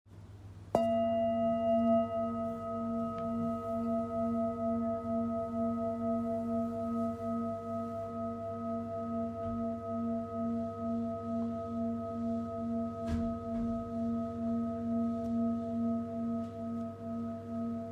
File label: bowl, singing bowl; 18cm; Polished Harmony Bowls